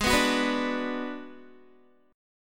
Ab Minor 7th Flat 5th Ab, down, Guitar, 4E, 4A, 5D, 5G, 5C, 6F; Abm7b5; strummed